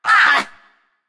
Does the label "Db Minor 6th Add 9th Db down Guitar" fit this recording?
No